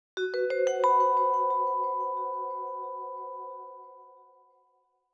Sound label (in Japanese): ジングル; Corpse Party Tetralogy Pack